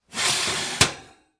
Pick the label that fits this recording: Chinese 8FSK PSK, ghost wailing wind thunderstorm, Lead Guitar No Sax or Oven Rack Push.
Oven Rack Push